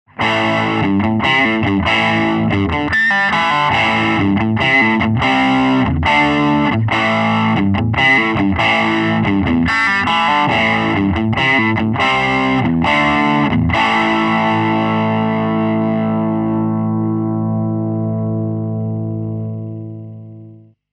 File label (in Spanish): saturado, guitar; monza